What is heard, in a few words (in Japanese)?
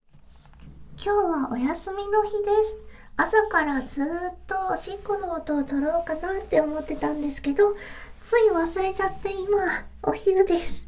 おしっこです, ASMR; １日目の１回目のおしっこです！